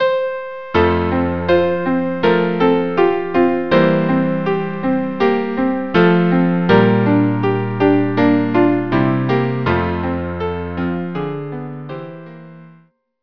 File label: quartet